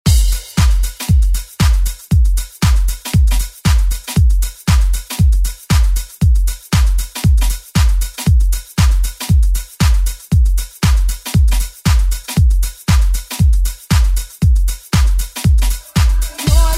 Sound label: 90s Re Drum